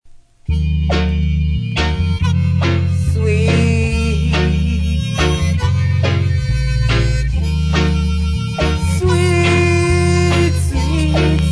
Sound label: sweet reggae rocking